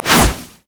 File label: foxfire; LoL; ahri